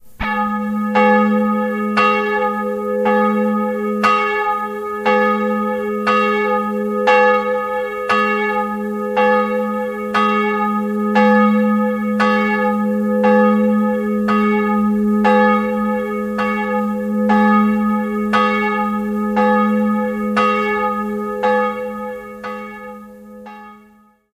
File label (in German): glocken